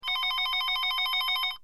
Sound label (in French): sonnerie telephone